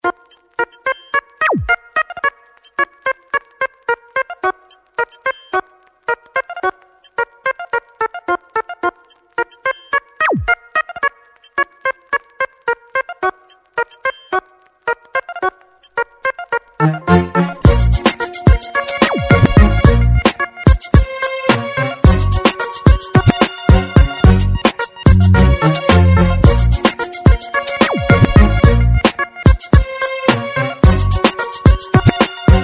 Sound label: instrumental